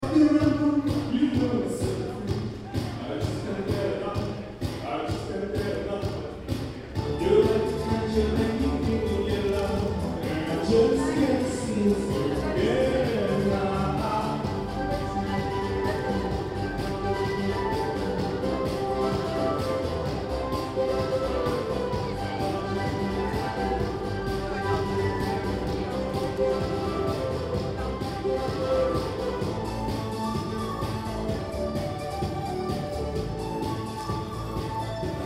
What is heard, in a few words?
Male singing